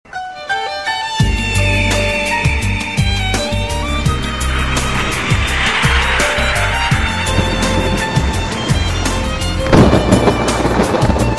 ringtone

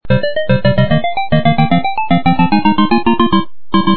battle city start

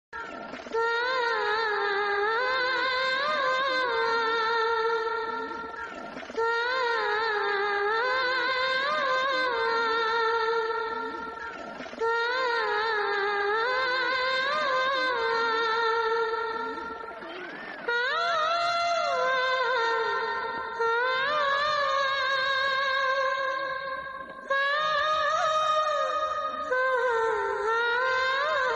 Flute

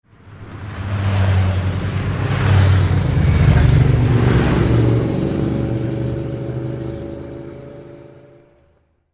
motorcycle sounds

